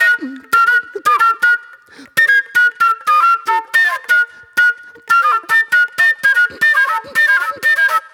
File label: Old Soul Flute; Sonic Foundry (Sony Creative Software); Manhattan Flutes; Good Times